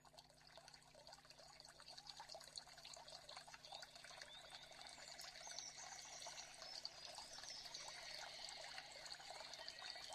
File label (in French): natural musique tambour chamanique